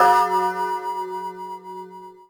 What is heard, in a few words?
Cym China; rainbow laser disco dungeon